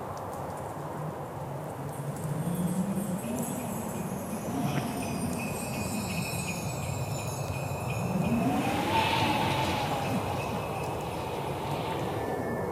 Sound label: Christmas Ambience